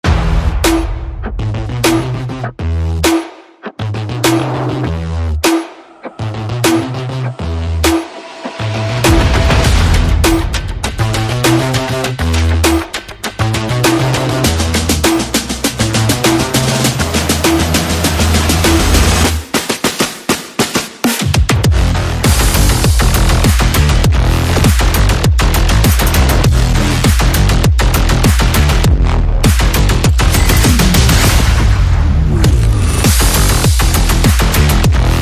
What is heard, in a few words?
Percussion Bass and Effects